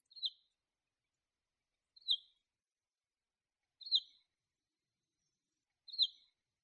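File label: suzume call, sparrow; nakigoe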